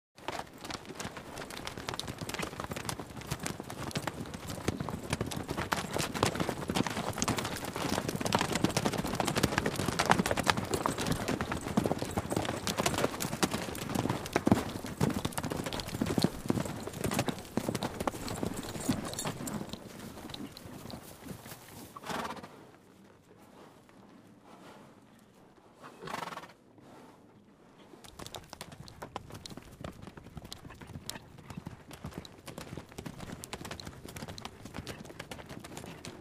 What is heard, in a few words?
several horses gallop